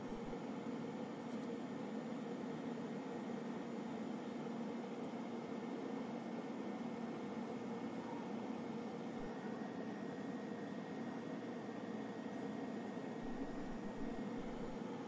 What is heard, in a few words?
Hum; The Joy of Creation Halloween